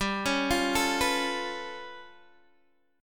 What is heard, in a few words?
G 9th Flat 5th, Guitar DADGAD